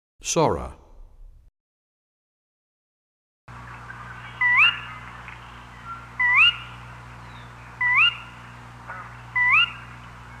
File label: Sora, bird songs; 101 Bird Songs